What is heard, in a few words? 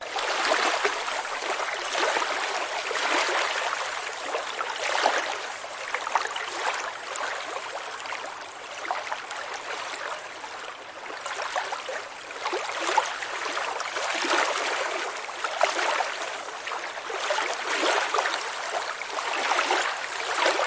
lakes and oceans water lake medium waves close perspective; silverplatter audio